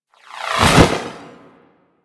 Idle Wing Flap; Dragon Pelt; Warframe

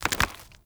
Mockery Foley Melee Swing; Characters; Enemy; Mockeries

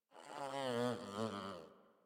Catbrow Kitten Yawn; Warframe

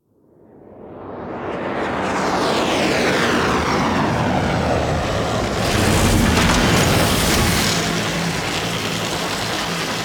Dropship Depart A; Warframe; Quests; The New War; Narmer Outpost

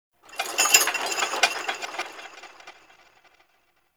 Sky Fortress Chain Move Trippy Low; Ambience; Ode Sky Fortress; Fortress Tunnel

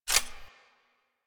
Bow Latch

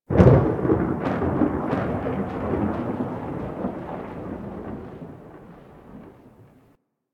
Hub Cephalon Suda Rand Thunder; Warframe; Ambience; Tenno; Syndicate Cephalon Suda

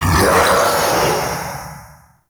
Mockery Male Vocal Pre Death; Characters; Enemy; Vocals; Mockeries

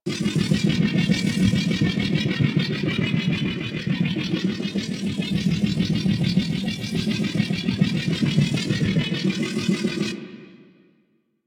Iron Shield Aura Loop; Warframe; Warframes

